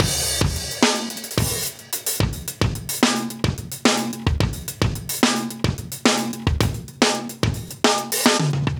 109 Funk, breaks

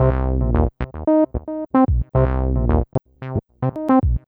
Acid Squelch, Bass

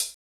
pedal hat; Giga Samples Collection; EMUSP12

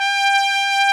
trumpet G4; Greytsounds VOL-1

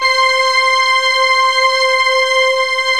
MLT TRK JX3P

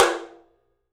OPEN TOM; 3D Sound; 3D Drums

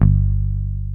PICK BASS

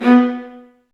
String Sections, Violas Marcato; Roland LCDP13

